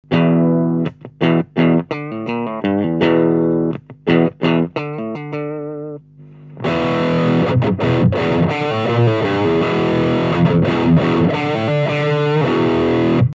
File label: Disto